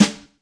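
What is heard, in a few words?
JAZZ snare; JAZZ KITS; Crypton; DrumBase5000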